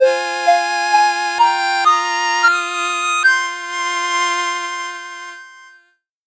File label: 8bit jingle normal